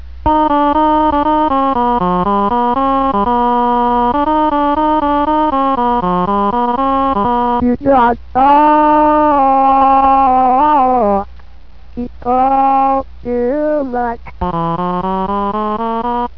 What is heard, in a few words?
computalker intro singing